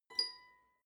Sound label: guitar clink single high